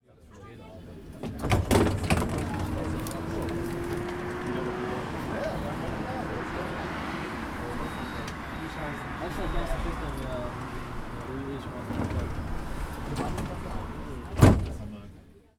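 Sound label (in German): Straßenbahn Türen; tascam dr-22wl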